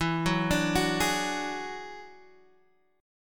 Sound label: F sharp 7th Suspended 2nd Sharp 5th, E